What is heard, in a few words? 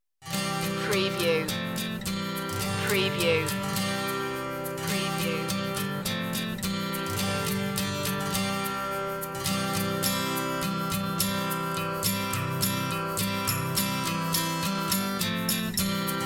old macdonald had a farm underscore version no sound fx